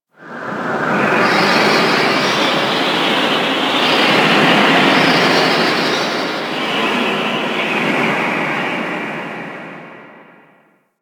Moon Space Wind, Ambience; Warframe; Orokin

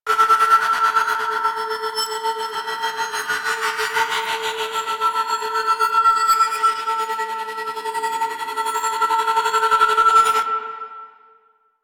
Glass Fragmented Loop; DE; Warframe

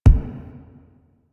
Button Press; Warframe; Quests; The New War; UI; Struggle Prompt

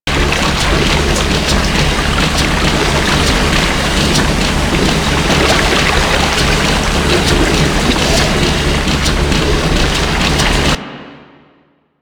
Research Facil Water Coolant Pipe Loop; Venus; Objective Rooms

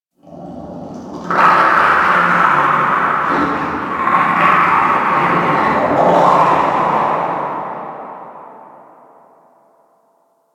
Infested Distant Creature Snarl, Ambience; Warframe; Corpus Infested

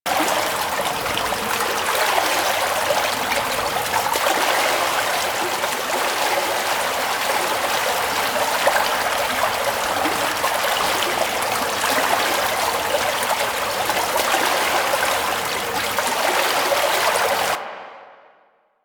Town Water Fountains Loop; Warframe; Duviri

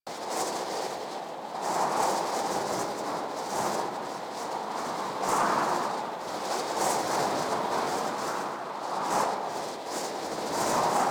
Generic Wind Loop Fast; Vehicles; Archwing; Eidolon

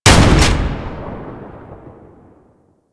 Tank Airburst Cast; Enemies; Weapons; Warframe; Lasrian

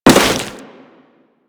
Crewman Step Dirt, Footsteps; Warframe; Corpus

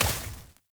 Short Sword Impact Vegetation; Weapon Impacts; Enemy; Swords; Sinecure Short Sword

